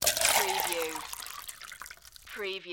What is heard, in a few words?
Water Pour Liquid; Sound Effects Bible